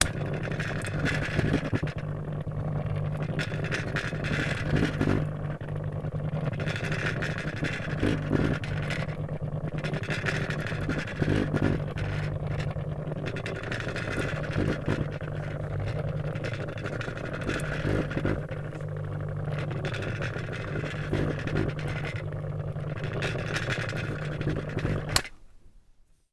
industrial motor disco light revolving on run off close up